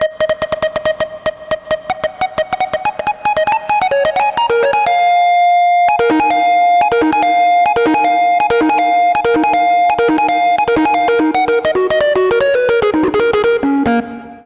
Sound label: Rhodes Solo, Cinematic Funk; Sony Creative Software; Cinematic Funk Urban Tone Poems